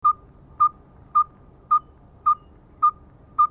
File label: pogoniulus chrysoconus, birds calls; lybiidae